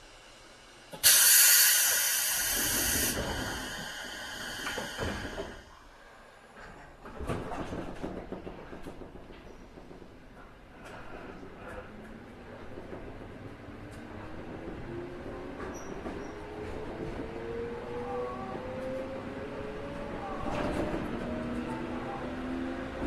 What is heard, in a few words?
utsunomiya takasaki 211